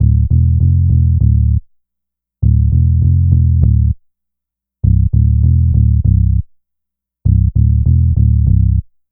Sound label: Synth Bass; Bass X